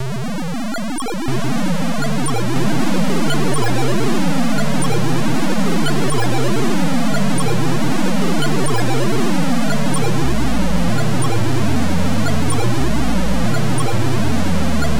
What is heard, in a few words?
warp